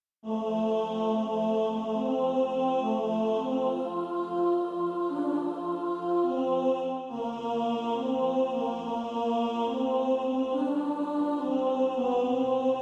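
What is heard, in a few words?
tenor, practice music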